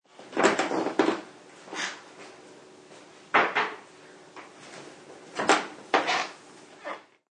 Footsteps Creaky Floor, Walking on a creaky creepy floor; Human